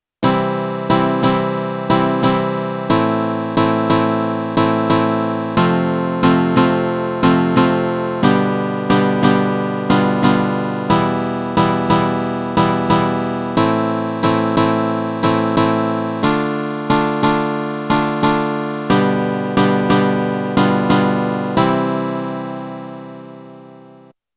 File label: key C diatonic backing